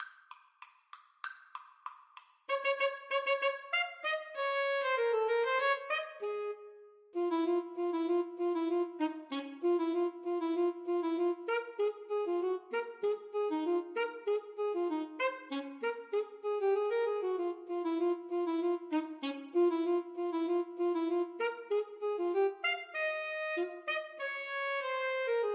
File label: radetsky march, Alto Saxophone Duet